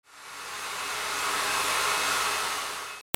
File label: HOUSE